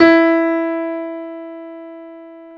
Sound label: PIA MICRO, MICRO-PIANO